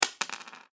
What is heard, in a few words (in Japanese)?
お金を落とす